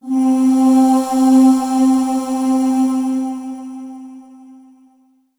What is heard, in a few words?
Yamaha EX5 Breath Choir C4